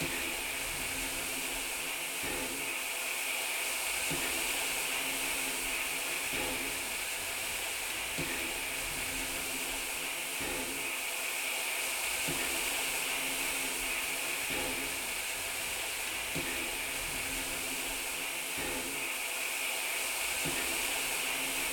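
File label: Scottish train local interior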